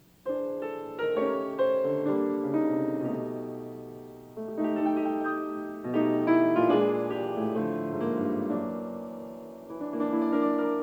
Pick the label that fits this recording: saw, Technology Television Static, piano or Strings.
piano